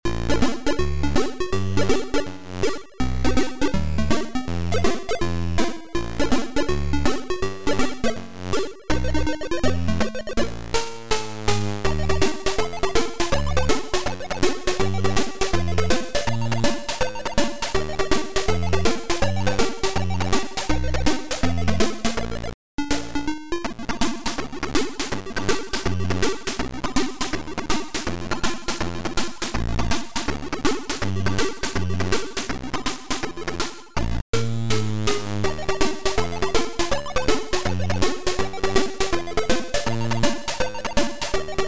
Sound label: JAZZZZZZ